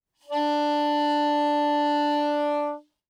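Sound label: Soprano Saxophone non vibrato ff D4; Woodwinds; Bb Soprano Saxophone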